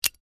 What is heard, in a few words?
Steel padlock medium lock opening